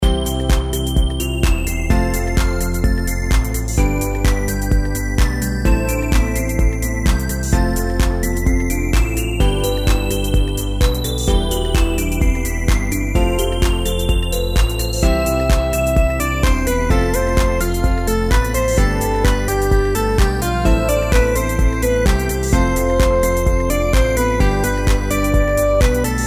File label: jewels reelspin bg; Quick Play Jewels